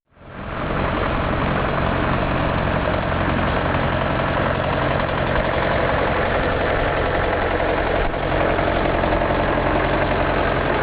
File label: fordson, traktorer